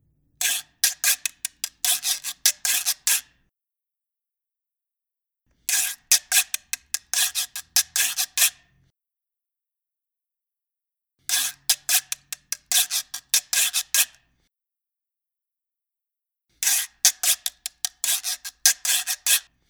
RECO RECO

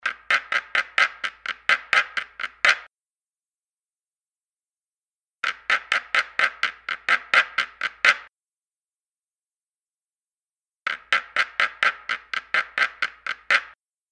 CARAXÁ

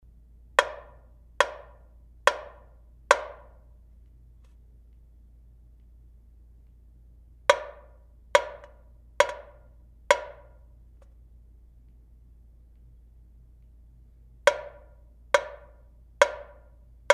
TAMBORIM DE SAMBA Toque preso

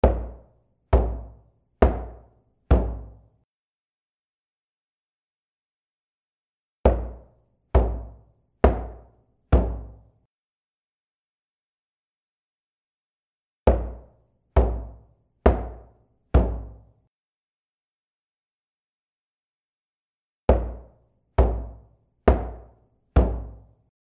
CAMISÃO PEQUENO Toque solto

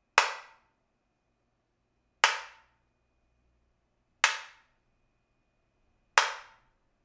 TAMBORIM DE SAMBA Estalo

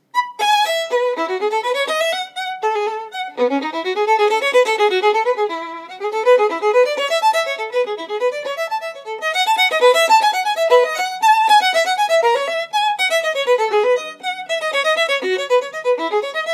Violino

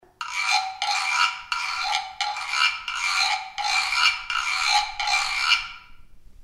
RecoReco

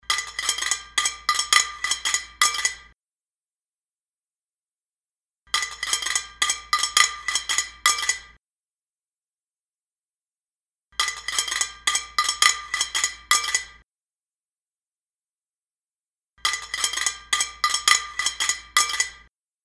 PRATO DE LOUÇA

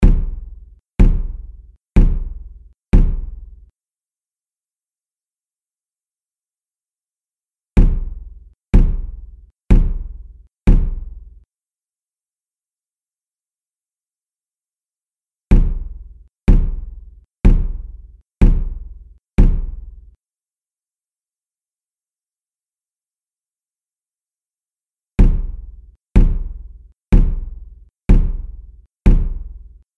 CAMISÃO GRANDE Toque solto

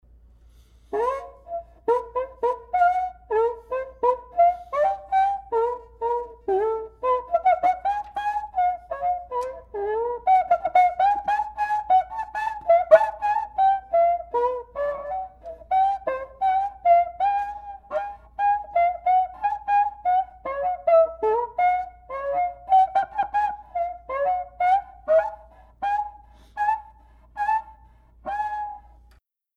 CUÍCA A entonação tímbrica

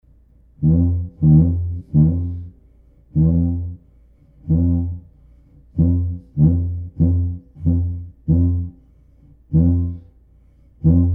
PUÍTA RONCADOR A entonação tímbrica

